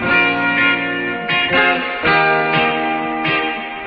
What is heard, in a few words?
guitar, Loops Phonky